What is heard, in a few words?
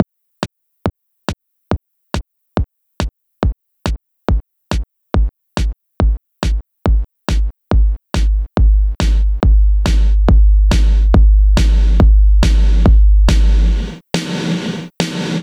Fill, Drums, Eclectic Electronica